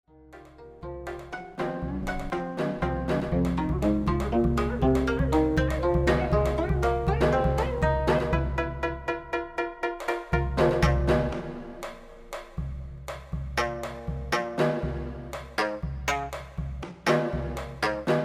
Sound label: String Tension, 18-string gayageum & janggu; 18현금, 장구